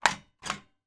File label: household stairgate open lock